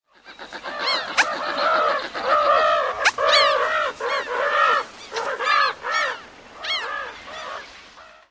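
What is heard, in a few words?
ROSSPO roosting calls, roseate spoonbill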